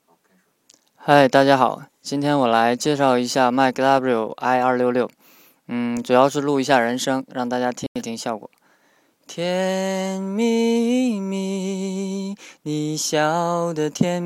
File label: Singing